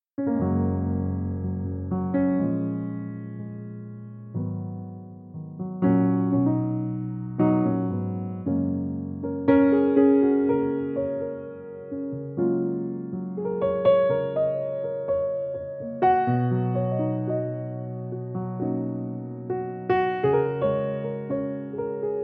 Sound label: Piano Version